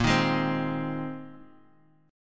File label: Bb 9th Suspended 4th Bb down Guitar Open F; strummed; Bb9sus4